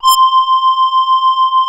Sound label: SQR LEAD C5, PPG, analog; USB Soundscan vol.49; Analog Fantasies; AKAI